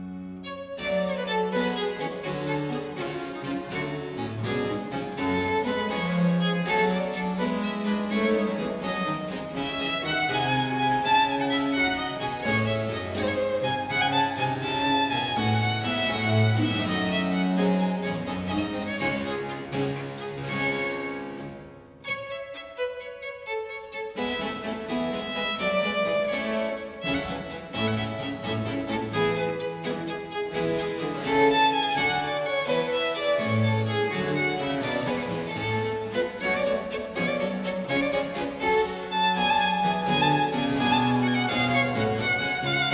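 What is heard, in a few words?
Sonata for violin & basso continuo in G minor - Vivace; Purcell; Complete Chamber Music; Brilliant Classics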